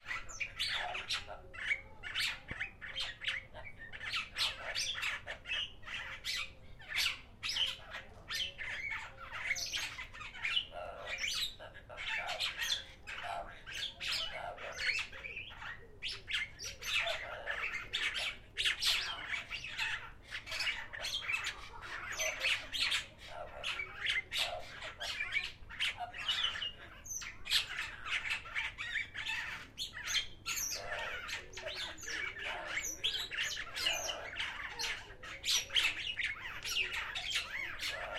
purple glossy starlings and amazon parrot, bird gardens; lotherton hall